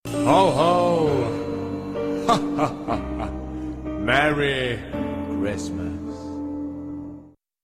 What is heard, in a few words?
christmas alert